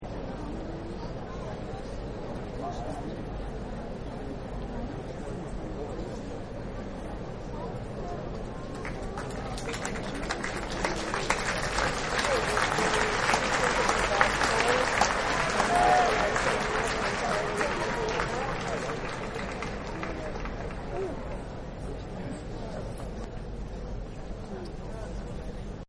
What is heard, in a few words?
Audience Ambience Lite Applause; Crowds; Sound Effects:Backgrounds; Partners In Rhyme